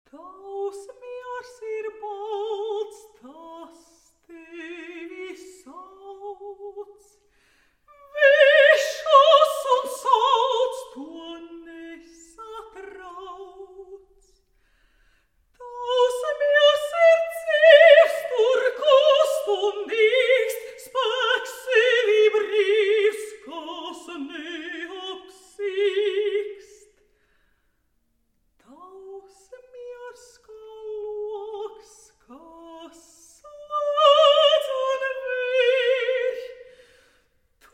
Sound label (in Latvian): Cikls Solo balsij A Capella; Mezharaups Imants; Miilas Dziesminjas; Tavs Miers